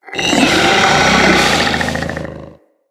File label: Infested Ancient Idle; Enemies